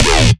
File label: bass hit processed acid D; Virtual Riot